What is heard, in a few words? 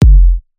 Kick Style Kick Drum Alternate